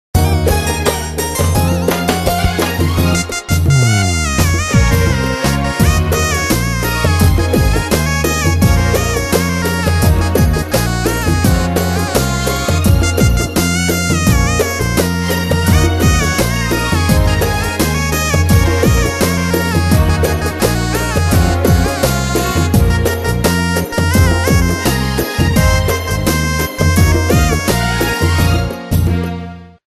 Nokia Tones; Windows Phone apps

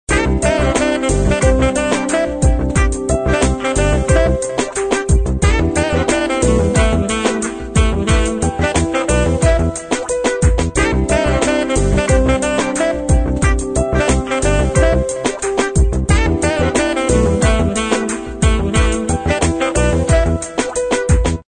Sax Talk; Sony Ericsson; ringtones

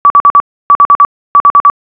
phone pwr off; Samsung SCH-M490 T Omnia

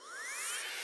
charging started, Nokia N900

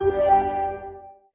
Restart

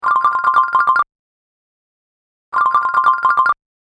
call mail; HTC; Infobar-A02

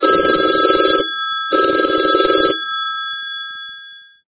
Classic bell; Samsung GT-I5800 Galaxy 3

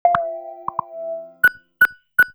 battery dead

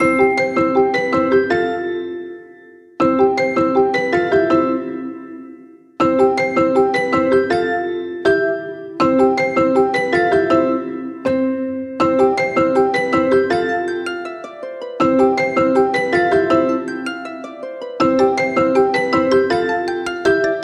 Inspiring, ringtones; China Telecom

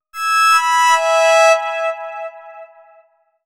Fiction Alert (Binary); Sony Ericsson; Xperia X1; PlusFourSix; Venus; Lars Edvall

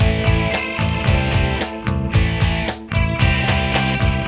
brit-pop, ringtones; Google; NewWave Labs

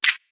camera click

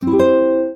Classic Guitar

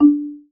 Marimba; Realme 8

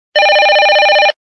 Traditional Ringer; Pantech P2020-Ease; Ring Tones

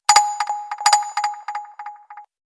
Metal Bits; Motorola Bravo

